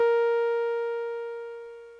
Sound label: bbm, Omnichord; OmnichordGodot